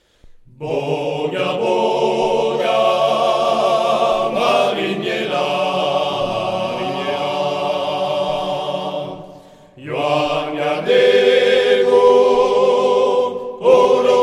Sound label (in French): Chant, trompes; Boga Boga